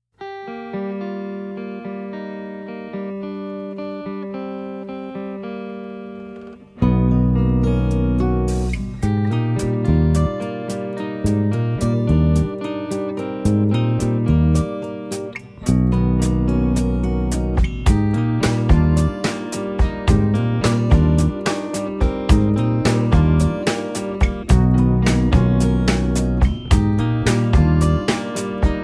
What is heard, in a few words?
instrumental